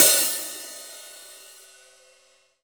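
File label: hi hat open; E-MU Producer Series Vol. 7 – Old World Instruments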